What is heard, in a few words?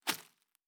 Leaf Walk Surface, Footsteps